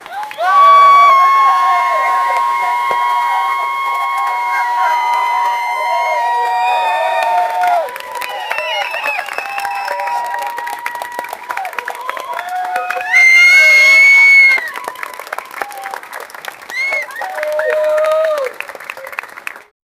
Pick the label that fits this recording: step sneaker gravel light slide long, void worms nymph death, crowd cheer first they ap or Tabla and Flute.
crowd cheer first they ap